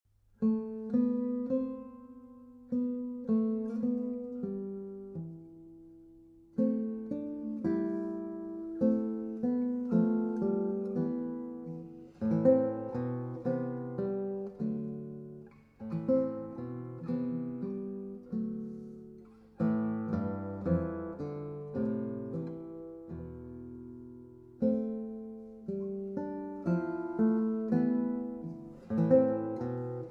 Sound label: Sarabande Pour Guitare; Poulenc; FP 179